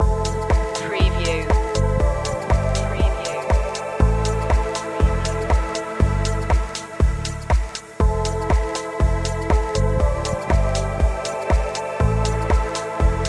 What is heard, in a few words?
light spin loop